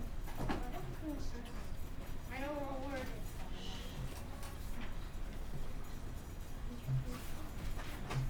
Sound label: Classroom, Grade 4, Subtle; Electa Quinney